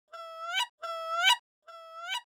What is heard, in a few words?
baby goose squeak; emerald-crossing